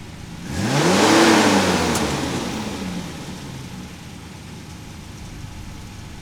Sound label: IDLE, Auto; trasporti